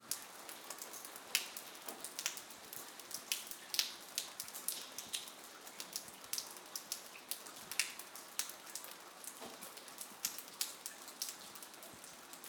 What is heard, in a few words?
Rain Night Village Drips Courtyard; Silencyo